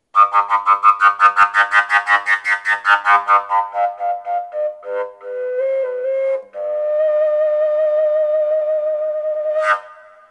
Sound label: fujaridoo ashen g; folkart slovakia